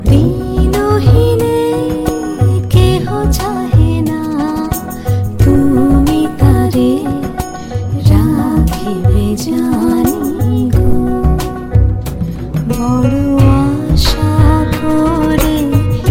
Rabindrasangeet

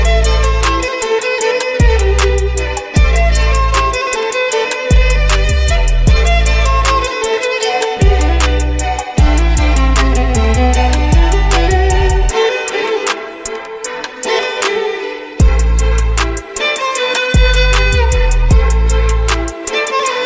Violin

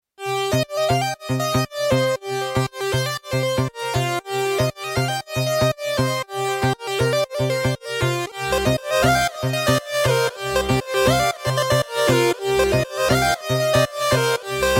Summer Violin

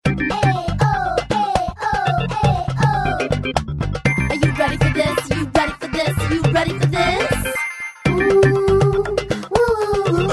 Salsa

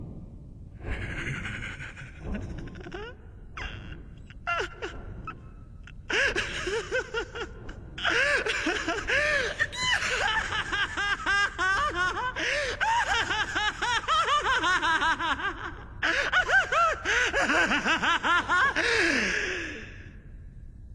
Crazy Laugh

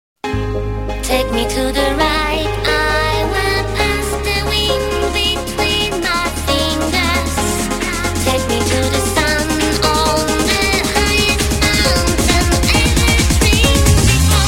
Romantic Tone

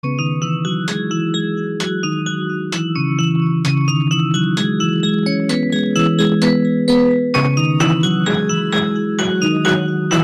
Pumpkinds Melody

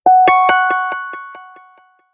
Overtone

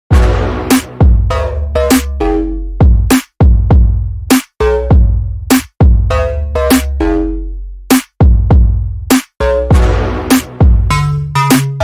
Metallic Pluck

